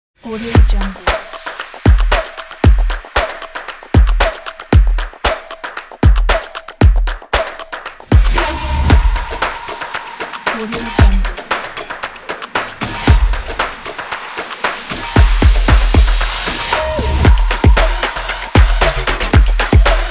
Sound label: drum and glitch